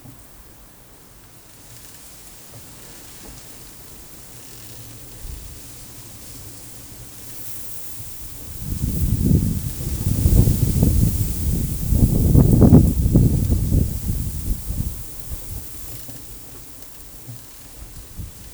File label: back garden sample ultrasound; AudioMoth; 192ksps